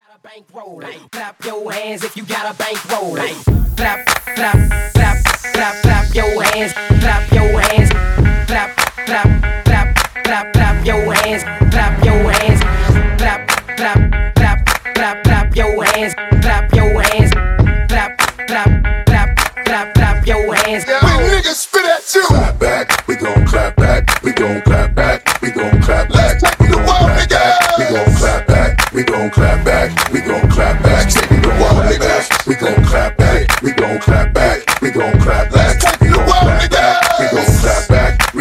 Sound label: Acapella Intro Outro, 102 BPM